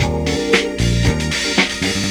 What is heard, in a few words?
Disco 114 D#, Lounge Lo Fi Break Fillz